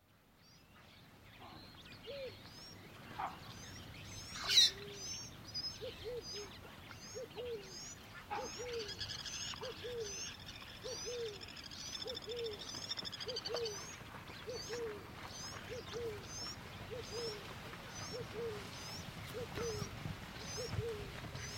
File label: zebras galloping and savannah dogs